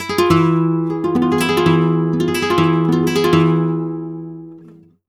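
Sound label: arpeggio guitar, Flamenco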